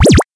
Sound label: match